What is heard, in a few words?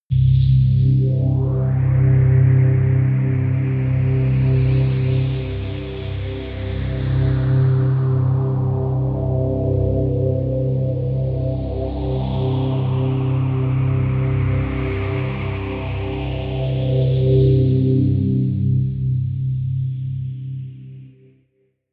pad sweep falter; flaechen